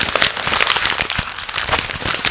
laser hum; mansion

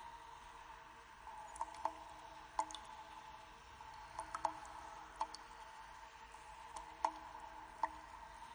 kids

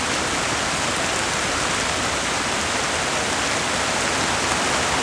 dog bark

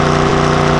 engine, cars; battaglia

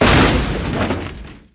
hit; pod roc

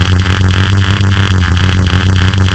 wah wah; junker

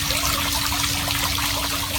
stream

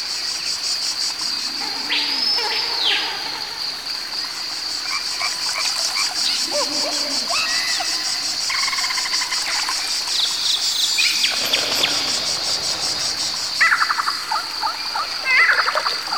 tropics

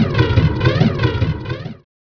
bomb

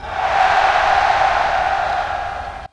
bats

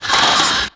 menu next

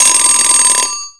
dog bark

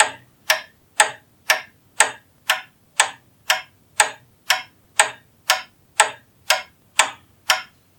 kids; mansion

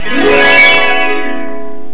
pickup; snowy river

